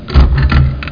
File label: SWITCH